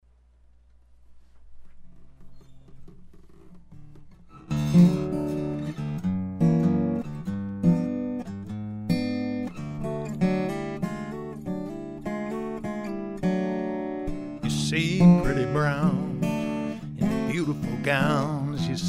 AM-16 1U, Langevin AM-16 1U, Neumann KM84 at 12th fret and 3″ from lower bout. Neumann U87 on vocal.